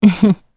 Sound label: Vox Happy; Hilda; kingsraid; voices heroes